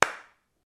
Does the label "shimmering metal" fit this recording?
No